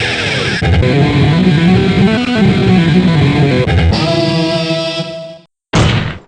guitar pound

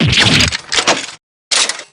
damage; dgs2-drebber

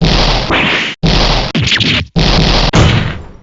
breakdown; wellington

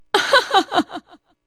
uhuhuhu; margaret; p4u

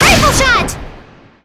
rifle shot; ruby

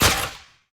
Lights On

